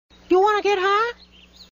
music; towlie

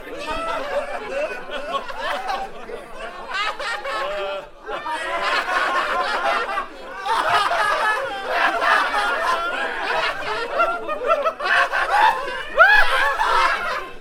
crowd laughter

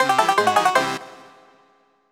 banjo question

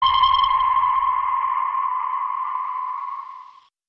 sonar

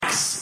bikes

